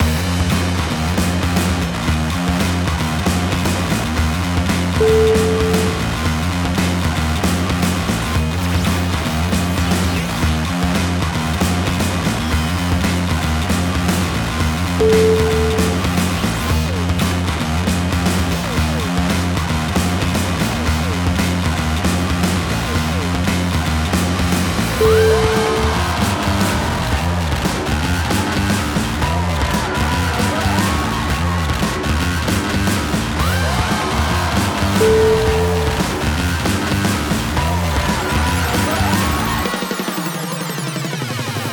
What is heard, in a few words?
chemical breakbeat